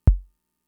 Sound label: Kick, Drum Machines Fricke MFB-522